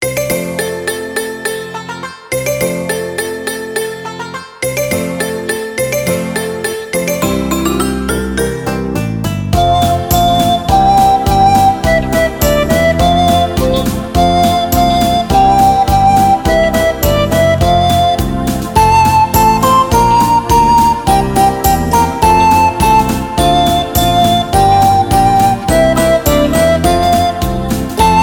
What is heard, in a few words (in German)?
Grün grün grün sind alle meine Kleider Listening, recorder